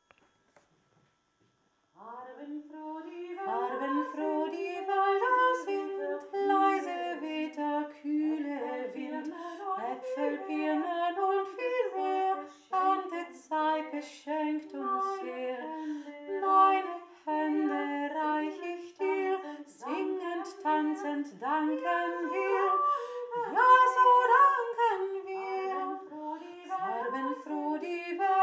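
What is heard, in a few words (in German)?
herbstlied kanon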